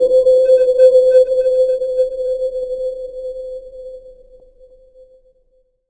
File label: Cool Bell; Jungle; Blu Mar Ten - Jungle Jungle - 1989 to 1999 Samplepack